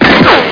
poww; Software 2000

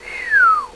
HI WHSTL, WINDS

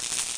ELEC

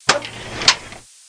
File Cab; Florida State Football - Ultimate Multimedia Experience

